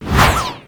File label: skill bear hit cast